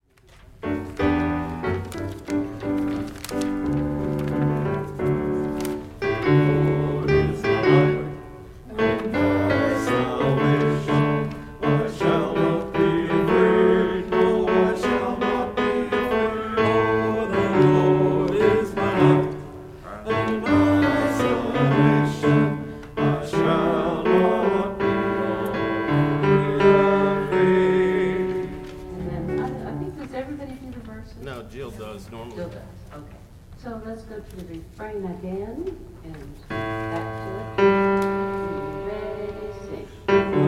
bass and tenor

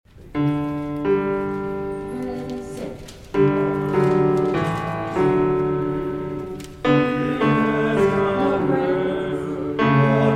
bass and tenor; Gather Hymnal

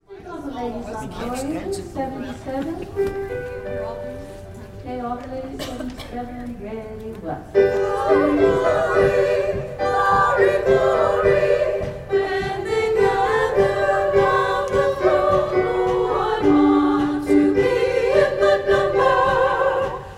ladies; When the Saints Go Marching In

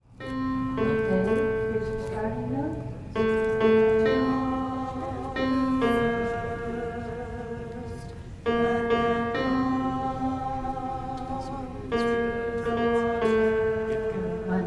alto; Gather Hymnal

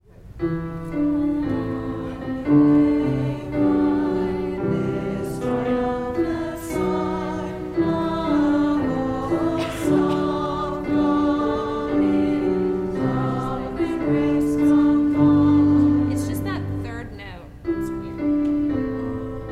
alto; 881 Lift High the Cross; Gather Hymnal

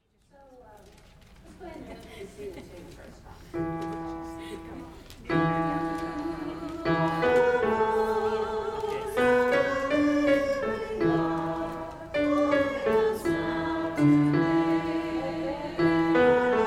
refrain melody; Gather Hymnal

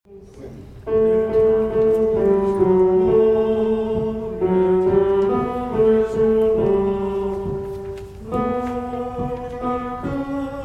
bass

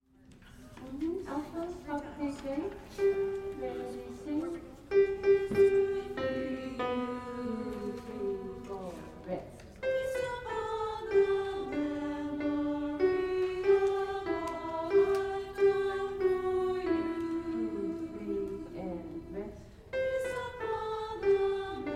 altos

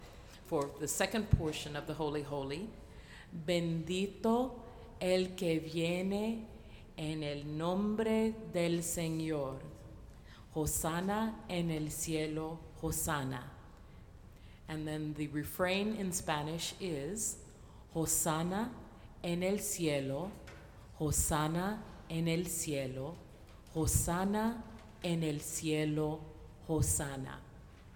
Spanish words; Holy Holy Holy

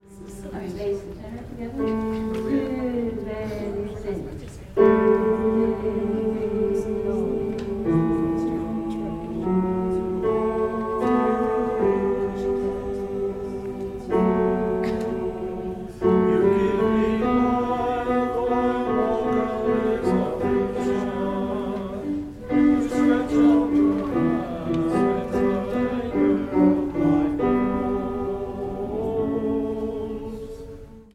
oo's tenor and bass

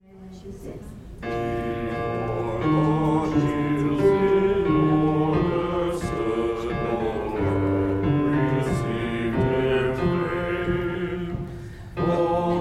bass; 688 O God Our Help in Ages Past; verse 3; Gather Hymnal

